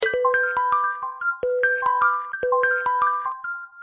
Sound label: xiaomi poco x3 sms ringtone